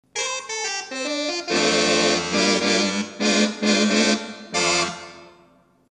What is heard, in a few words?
4' Tibia 8' Posthorn, theatre organ